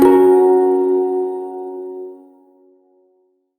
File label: Prime Shawzin Yo Chord, Music; Warframe